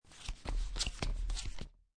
Playing Cards Dealing Three Cards Flop; Cards (Sound Effects:Casinos)

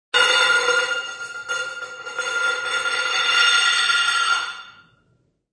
Metal Lid Spins, Metal lid spins on floor.; Spinning; Objects

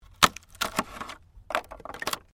WOOD PLANK BREAK

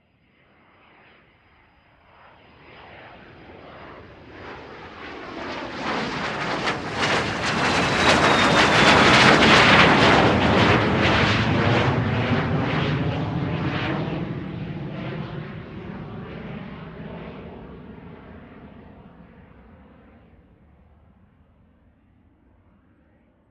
Private Jet Pass By, Aircraft, Pass, Overhead; Transportation; Airplane; BlastWave

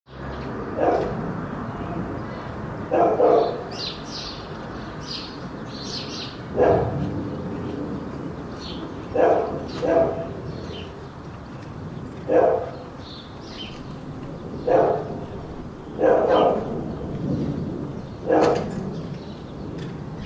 Back yard ambiance with dog barking.; Dogs; Animals

